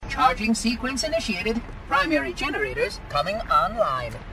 Charging Sequence; meloboom

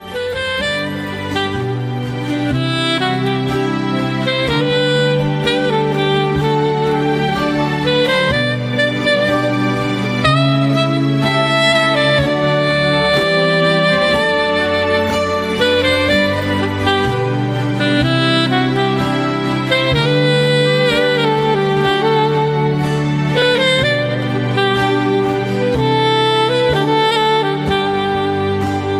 saxophone; meloboom

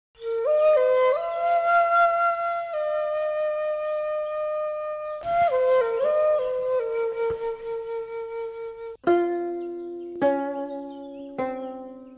Msg Japanese Music

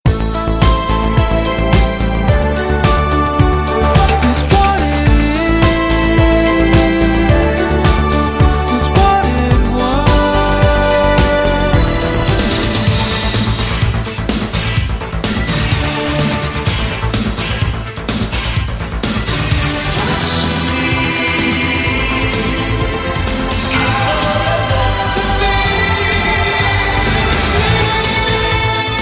Pop Song

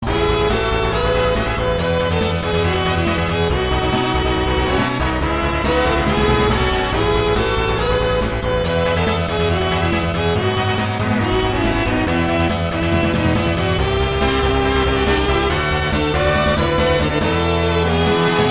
8bit

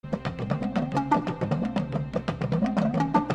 drums; Wakanda

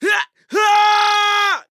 Castle Screm

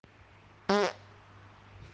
Real Fart

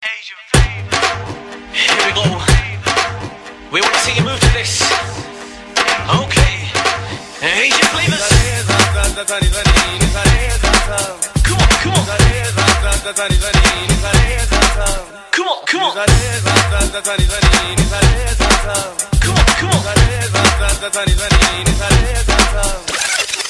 Dhol Beats

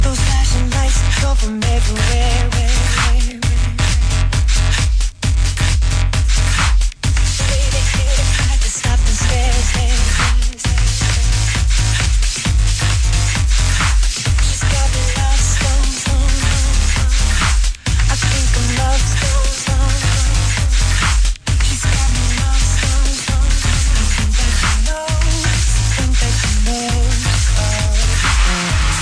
Techno

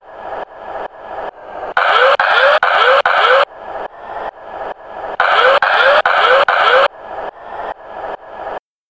Chopper

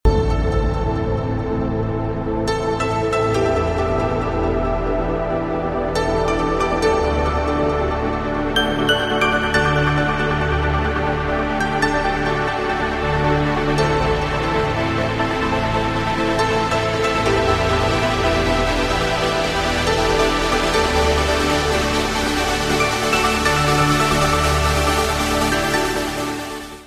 trance

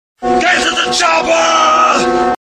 Chopper

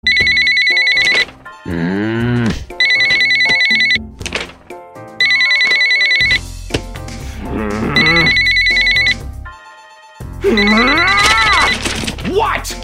Ring Ring WHAT

